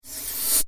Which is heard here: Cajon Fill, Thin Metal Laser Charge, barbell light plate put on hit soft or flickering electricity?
Thin Metal Laser Charge